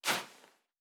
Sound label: Footstep Sand Walking; Character Sounds